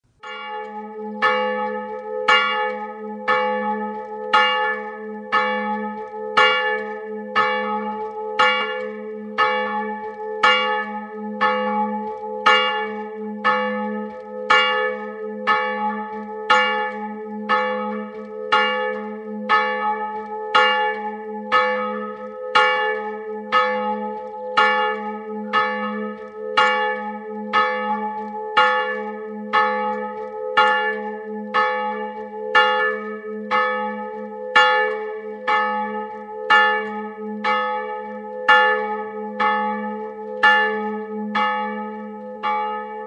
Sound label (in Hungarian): HARANGSZÓ DÉLRE; szignalok; SZEKELYUDVARHELY